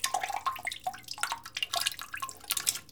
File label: water drip, sink